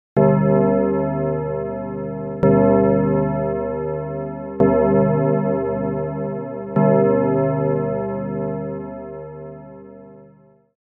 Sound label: fm midnight bell; pro12